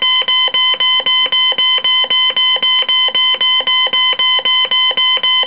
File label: stall; acm-5.0